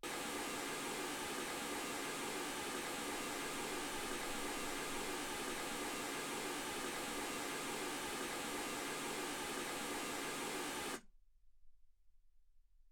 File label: mls 48kHz